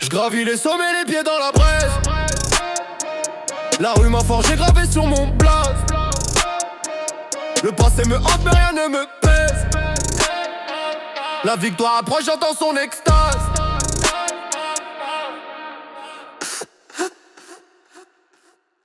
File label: Trap Rap; rimes embrassees